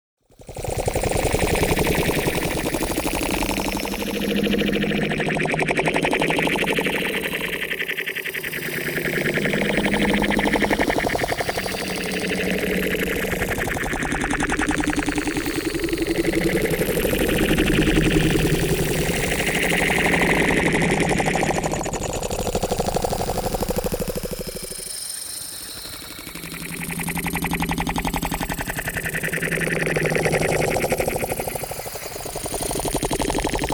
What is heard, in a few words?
NEON MOVEMENT; Unknown Language; boom library